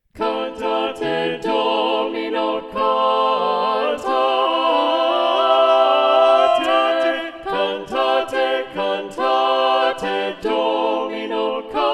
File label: Cantate Domino SATB Balanced Voices; Giuseppe Pitoni